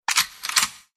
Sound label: Shot